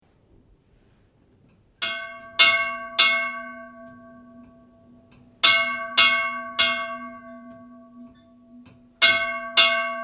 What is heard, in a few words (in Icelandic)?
tungufellskirkja stora, kirkjuklukkur